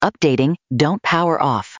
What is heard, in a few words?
Upgrading; HA-MIC05R2